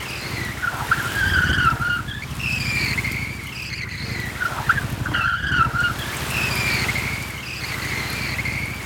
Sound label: SKID WATER; YBR125